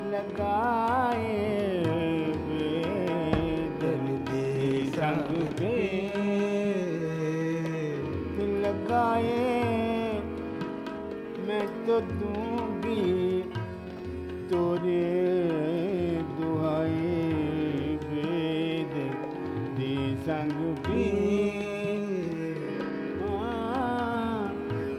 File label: Thumri Kafi - Be Dardi Sung